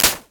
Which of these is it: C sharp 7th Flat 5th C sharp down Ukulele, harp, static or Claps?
Claps